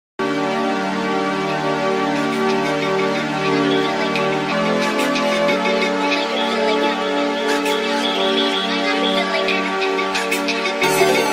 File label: sped up reverb